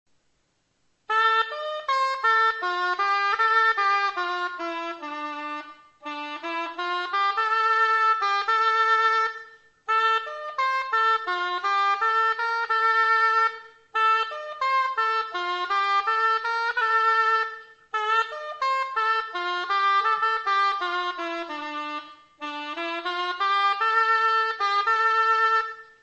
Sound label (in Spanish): chirimia; UnidadQuijote